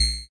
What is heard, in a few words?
beep